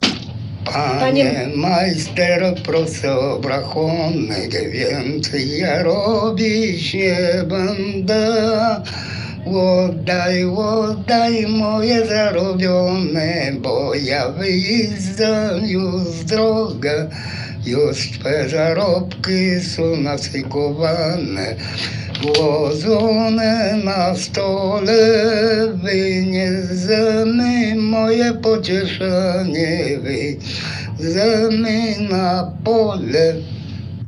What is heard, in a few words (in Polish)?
Panie majster prose o obrachunek melodia oryginaln